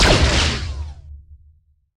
theta shoot, weapons

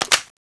clip in; tfa cso; mp40

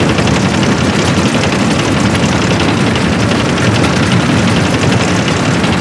tank track

